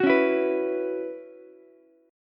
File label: B Suspended 2nd Suspended 4th, Ukulele; Bsus2sus4 strummed; JGuitar